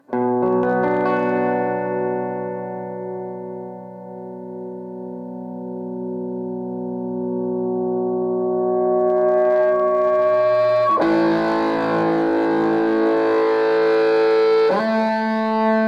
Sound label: Angry Cello; Line Amp Clip; VoodooSound